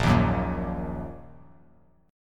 A sharp Suspended 4th Sharp 5th down Bass Standard; strummed; A#sus4#5; JGuitar